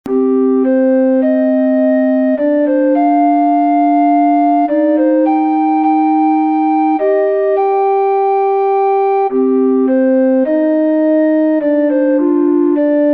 The Alto in the solo version is joined by a Bass in this new duo; Elegy; An Elegy was composed in memory of friends; recorder; A lyrical duet in ternary form; American Recorder Society